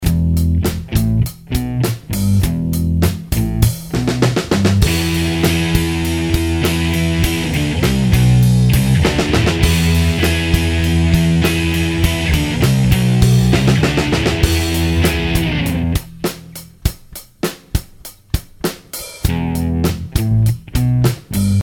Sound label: guitar and bass no separation